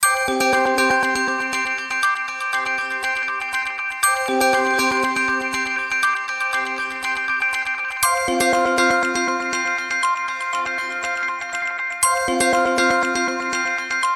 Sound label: Electronics, ringtones; WAO